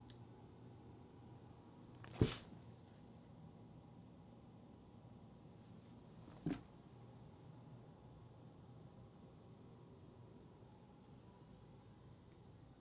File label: Computer Chair Sit